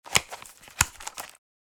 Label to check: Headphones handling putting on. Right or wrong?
Right